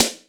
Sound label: Snares, Knocks